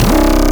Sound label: C Bass, DnB One Shots